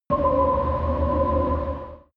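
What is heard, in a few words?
extrinsic vocals, energy; energie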